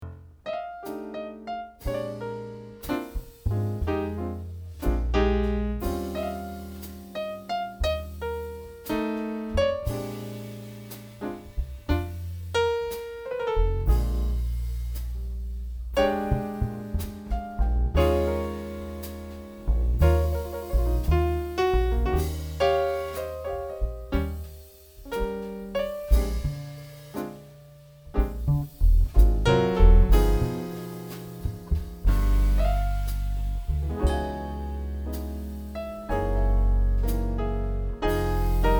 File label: Blues